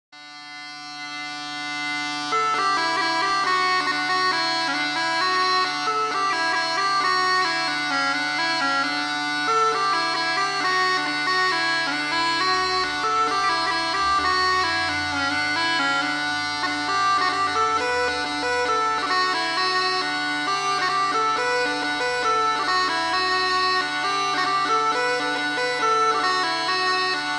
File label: bagpipe sample